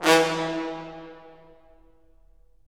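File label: brass cimb, Roland; Roland LA Composer vol. 4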